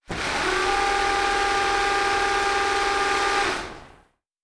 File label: servo big, sci-fi sounds, mechanical